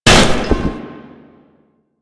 Umbrella Stand Destroy; Warframe; NineteenNinetyNine

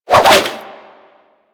Sword And Whip Spin Whoosh; Warframe

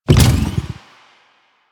Rev From Stop, Motorcycle; Motorcycle Ninety Nine

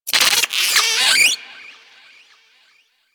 Forest Rodent Vocal Captured Lift Away; Warframe; Hunting Critters

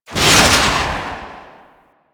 Sniper Alt Fire Proj Whiz By; DE; Warframe; Frumentarius; Exalted Sniper

